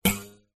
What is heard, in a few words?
cartoon spring twang